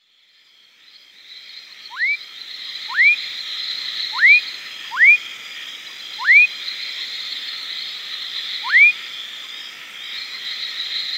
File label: Leptodactylus fuscus